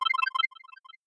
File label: Button